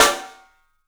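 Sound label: PICOLO SNRS, snare, drums; 3D Sound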